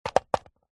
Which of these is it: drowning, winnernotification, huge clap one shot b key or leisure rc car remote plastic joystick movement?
leisure rc car remote plastic joystick movement